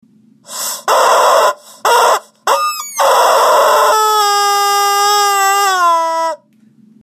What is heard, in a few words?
screaming chicken